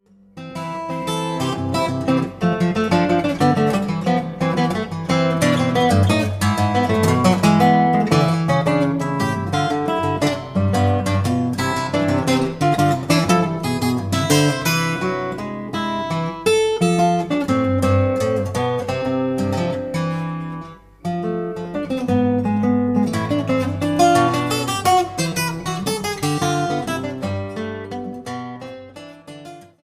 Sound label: Waltz